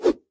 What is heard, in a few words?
camera air; Golden Crayon Sounds